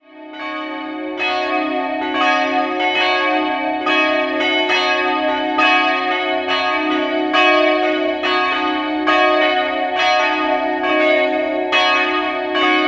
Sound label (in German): Nürnberg Langwasser Passionskirche, Glocken